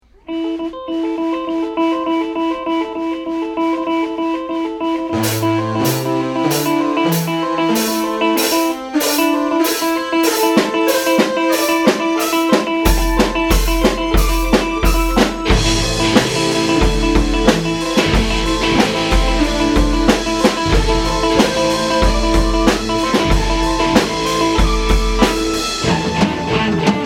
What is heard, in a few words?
Instrumental; rockbandacademy